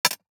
DICE on Glass, Throw and Roll, Metal, 1 One Die; Samples; ArticulatedSounds; DICE Sfx Party (Extended)

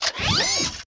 Menu Scifi

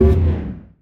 ship bad Start; Space Wave Race

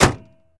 Truck Dakota Hood Close; Trucks

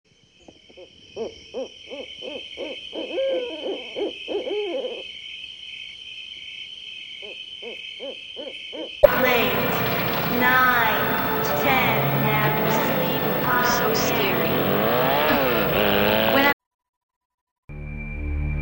Scary Sounds Gothic; 1001 Sound Effects; Halloween Scary Music and Sound Effects

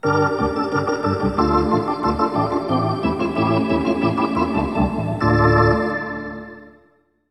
Hockey Organ

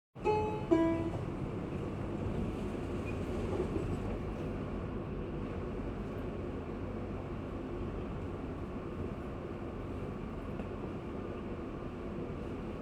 Metro Ping Pong Internal sound; KMP Film Video Sound Effects

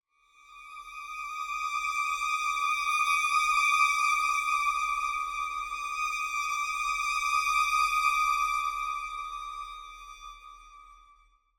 Symphonic Strings Thin Tension Pad; Hollywood Edge; sound designer's toolkit v2

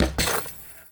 Street Ball Glass Basket Chain; SFX Kit; Street Basketball

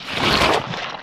Plant Giant Vines Shrink; SFX Kit; Foley - Misc

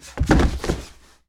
Bodyfall Wood; SFX Kit; Bodyfalls

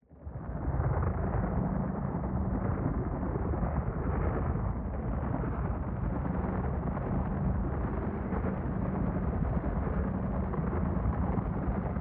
AMBIENCE Energy Thruster; boom library; Matter

